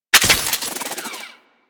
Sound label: Shotgun Glaive Return Mech